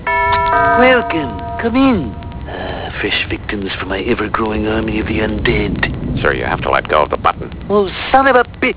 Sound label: doorbell